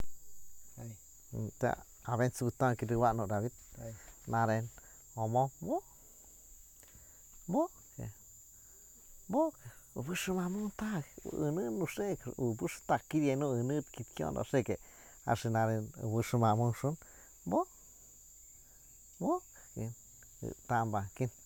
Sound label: Jungle frogs continued